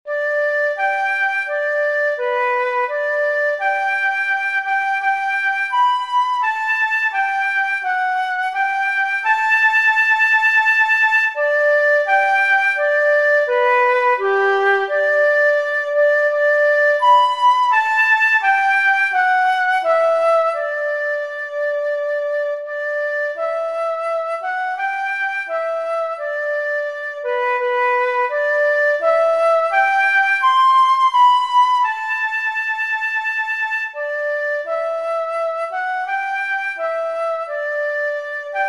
flute, solos; Advance Australia Fair

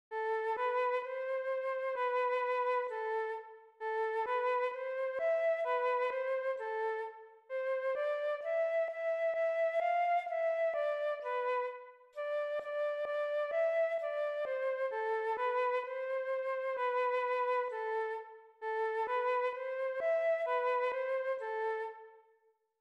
Harem Dance, flute solos

